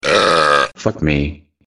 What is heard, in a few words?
Swear Burp